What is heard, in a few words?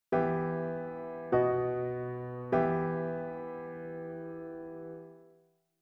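E minor perfect authentic cadence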